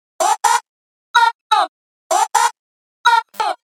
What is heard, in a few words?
vocal 126 technochoir; Wave Alchemy Loop Tools and Grooves